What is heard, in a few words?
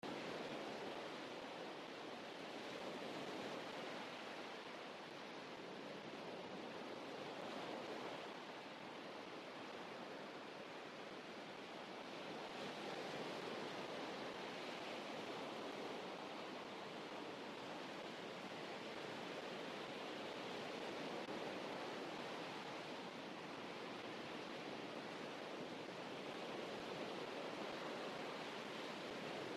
waves